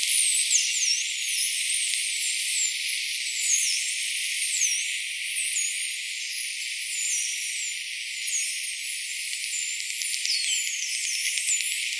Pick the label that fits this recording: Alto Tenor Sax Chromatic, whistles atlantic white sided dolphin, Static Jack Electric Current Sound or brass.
whistles atlantic white sided dolphin